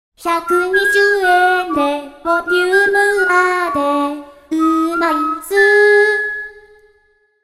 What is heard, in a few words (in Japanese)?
potato, uta